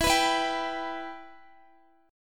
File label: E Minor E down Guitar; strummed